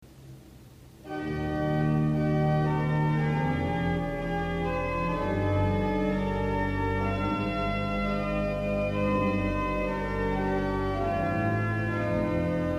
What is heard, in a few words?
Choral Variations, organ